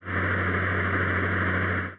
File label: grinder